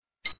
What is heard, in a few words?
scan success